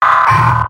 pyro pain sharp; vo; tf2; mvm

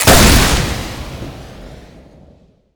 rocket direct hit shoot; tf2

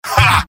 laugh short; mvm

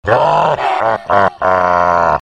eyeball laugh; vo; tf2; garrysmod; halloween eyeball

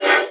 throwable grunt; weapons; breadmonster

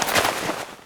weapon inspect movement; tf2

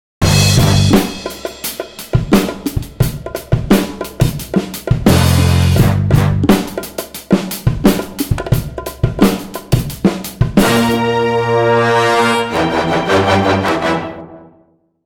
round start casual

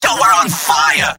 scout positive vocalization; tf2; mvm

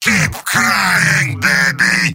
yell; heavy; mvm

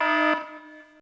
last life, race; Garry's Mod; racing tool

